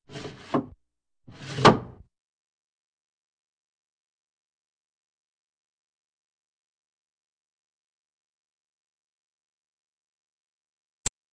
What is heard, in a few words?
Track, Doors; 헐리웃특수효과음; 문 소리